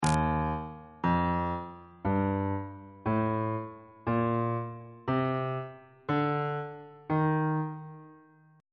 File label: e flat lydian mode on bass clef